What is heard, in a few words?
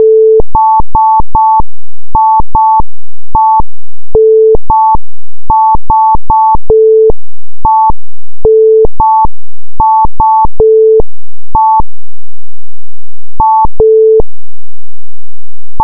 morse; dcode